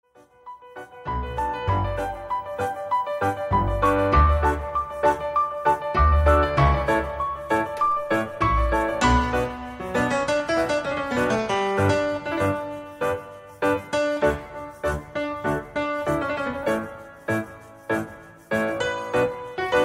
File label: Piano Cover